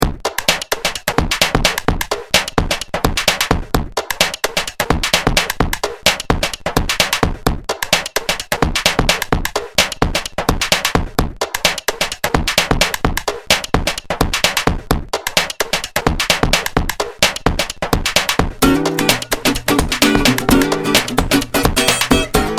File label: (instrumental)